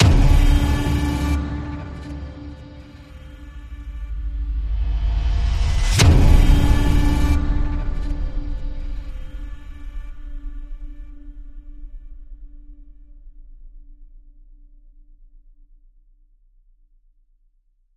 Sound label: Hit Downrange